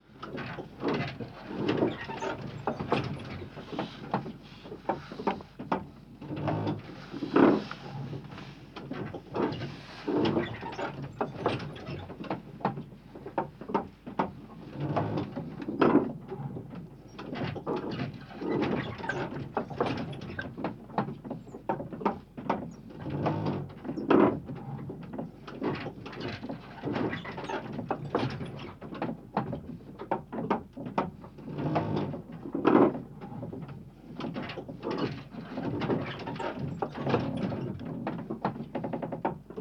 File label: WINDMILL Mono; Toybox